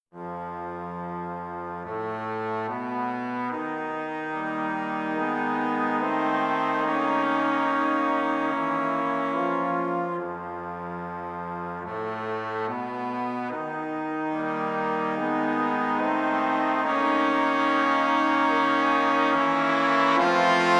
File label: Trombone quartet